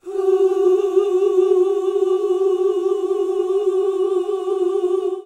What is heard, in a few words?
OOH F#3, GOSPEL; Best Service; Hallelujah; GOSPEL 10